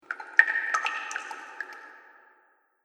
Water Fantasy Cave Drips

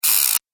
Fast Clockwork Mechanism; Science Fiction Sound Effects

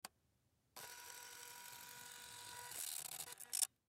Camera Turn On, Digital Olympus Camedia C720; Technology; Cameras; Electronics; Blastwave

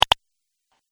Positive Double Click Sound Effect; Twistedtracks

